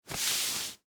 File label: Dress Shirt Wipe, Hand; Foley, Clothes; BlastWave